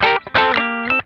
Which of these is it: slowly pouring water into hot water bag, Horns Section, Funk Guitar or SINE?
Funk Guitar